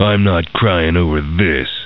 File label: cry; duke